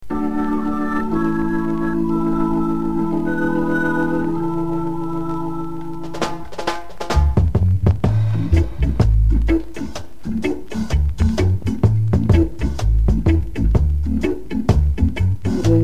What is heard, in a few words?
Birthday Dub